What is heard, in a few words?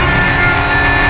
blower gear whine, vehicles